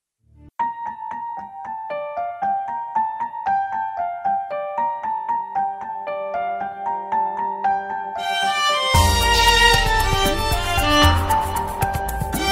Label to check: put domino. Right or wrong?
Wrong